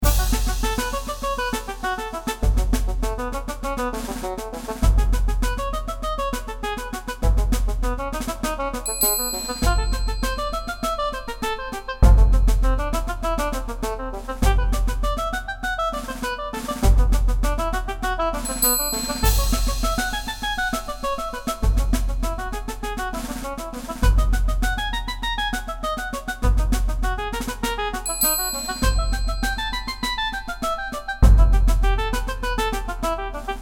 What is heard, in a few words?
Kitten Combat Looping